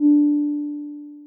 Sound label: Radar; Okitoki Games; EasyTalk